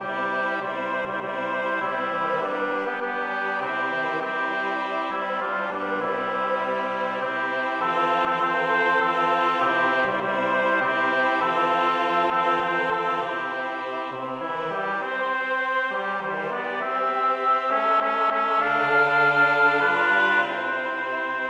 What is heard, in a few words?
polyinstru; hymns; Himnario grande